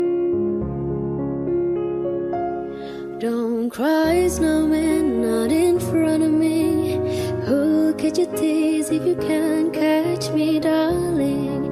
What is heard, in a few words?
english ringtone